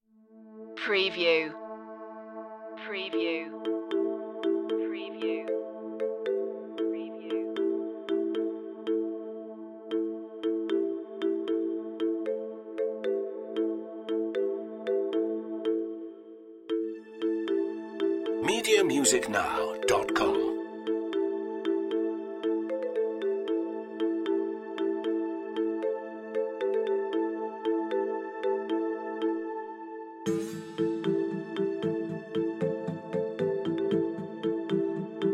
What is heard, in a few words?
strange ways no drums